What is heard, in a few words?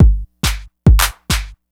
4 floor beat, trance